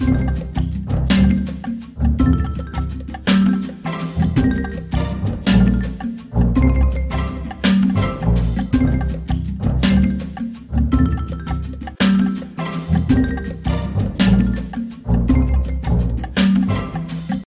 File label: Jungle Level; sim theme park theme park world 1999 pc gamerip